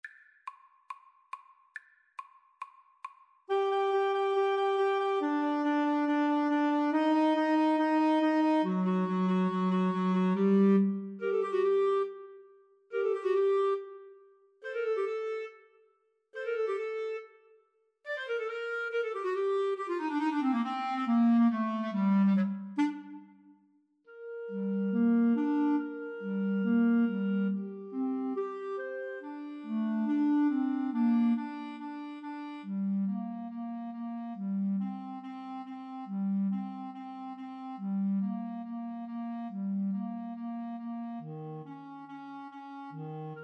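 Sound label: mozart symphony 25 3 clarinet karaoke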